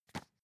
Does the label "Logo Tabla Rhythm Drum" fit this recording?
No